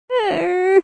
Emote Grin Princess

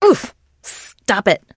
trader pain respond; 七日杀; 商人杰恩

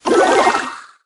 skeleton deploy

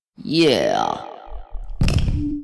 Goblin Mic Drop; Emote

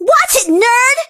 hurt vo

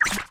robot win reload

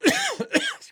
trader male neutral cough

